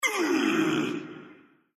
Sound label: hit tusk